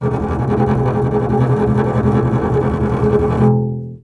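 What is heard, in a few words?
string contrabass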